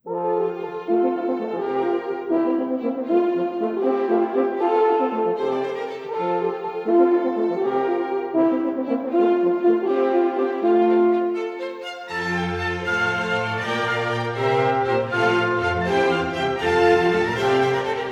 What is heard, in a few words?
Concerto for Two Horns in F Major, Rondo, Allegretto